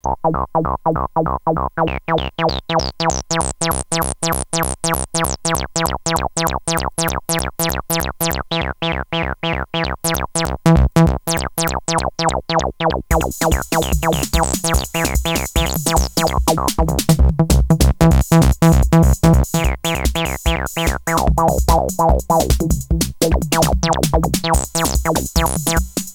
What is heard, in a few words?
saw